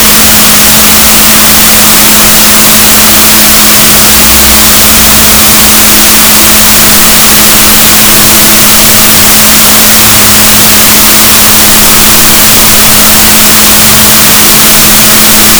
rorquals negatif, gaussian noise, Balaenoptera Physalus Chile